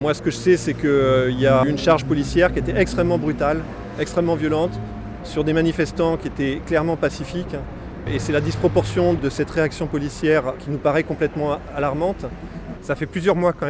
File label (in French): Manif; paysbasque; ATTAC